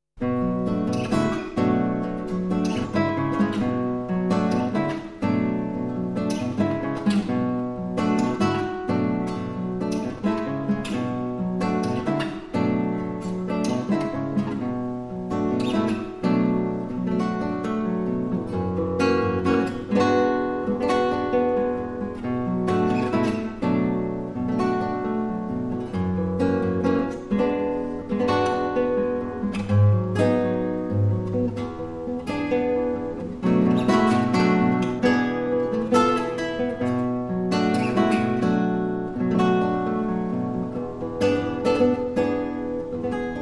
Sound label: Gtr Grapevine Start